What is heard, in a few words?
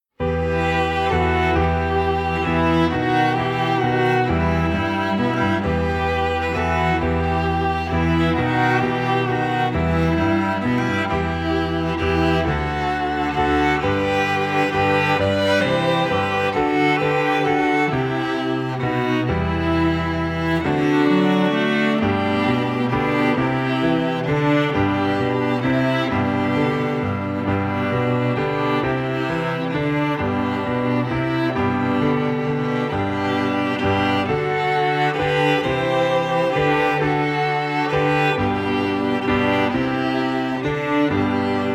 Trio with Piano; We Three Kings